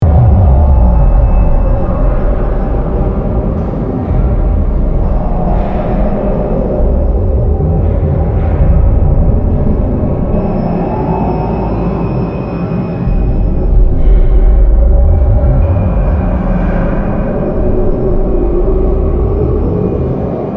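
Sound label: Ambience Gangplank; Amb Eudora Gangplank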